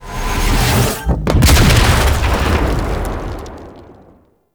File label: Explosion; Warframe; The New War; Cinematics; Drifter Lotus Chase Intro